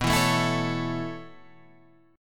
B 9th Suspended 4th B down Guitar Half Step Down; B9sus4

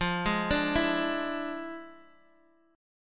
E 7th F, guitar; E7/F arpeggiated; JGuitar

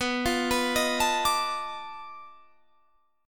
B 7th Sharp 9th Flat 5th, down, Guitar, Drop D

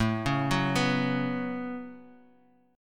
A 6th Add 9th A down, chord; A6add9; arpeggiated

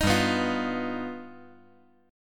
G Augmented 7th

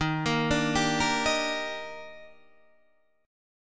D sharp Major 7th, Guitar Half Step Down

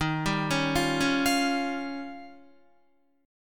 D sharp 9th, Guitar; D#9 arpeggiated; JGuitar

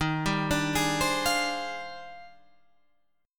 D sharp Major 13th, down, chord; arpeggiated; JGuitar

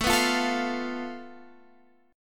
A Suspended 2nd Sharp 5th

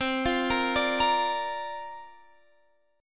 C 9th Suspended 4th down Guitar Standard; C9sus4 arpeggiated; JGuitar

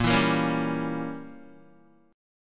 A sharp Diminished 7th, down, Guitar; strummed; 4E,4A,5D,5G,5C,6F; JGuitar

